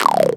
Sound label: Mouth Bass; Aawaz; Gully Loops